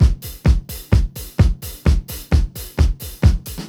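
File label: PIN BEAT2, 130 bpm; USB Soundscan vol.32; Roots Disco; AKAI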